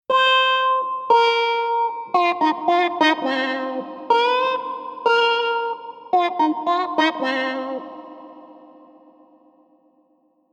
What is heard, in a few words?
spring wet